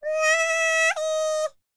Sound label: MACEDONIAN, World Voices; ILIO; Vocal Planet VOL-5